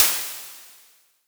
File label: Crashes; LIMAT Div Dept; Drums